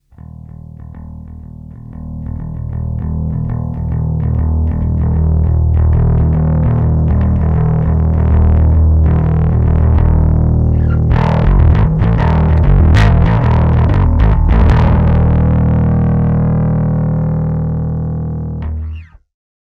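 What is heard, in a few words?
overdrive drive plus, Pocket Bass Amp; palmer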